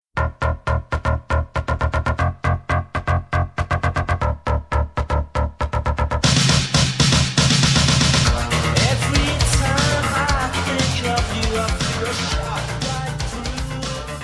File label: yamaha dx7